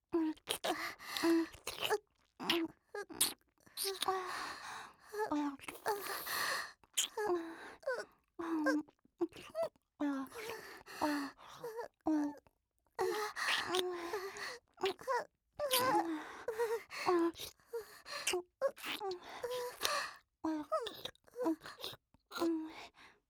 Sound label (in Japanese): お姉さんが僕に耳舐め（ディープ）